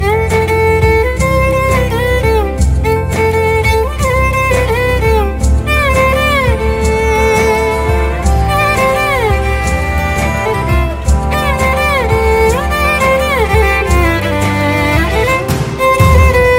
violin ringtone instrumental

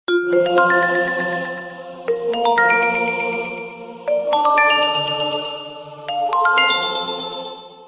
Samsung Relax

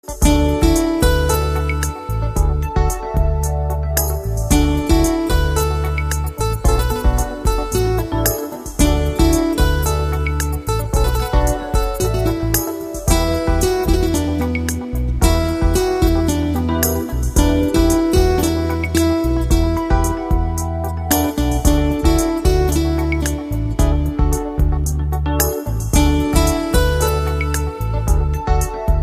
Instrume

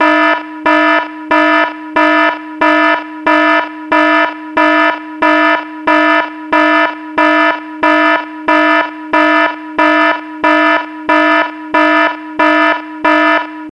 Emergency Alert

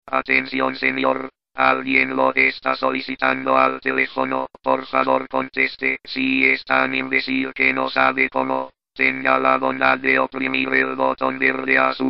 Robot Ringtone

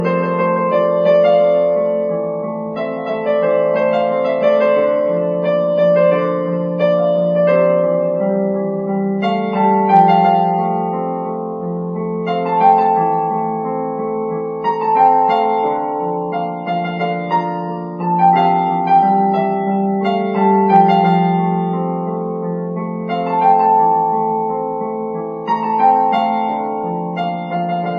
Piano Inst

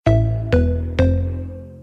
Iphone New Sms

